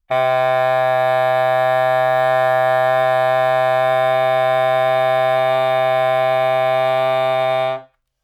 低音加键唢呐 筒音 自然衰减 力度强

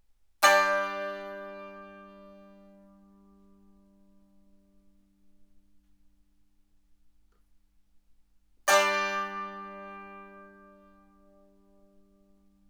傈傈族奇奔 演奏技法 扫弦

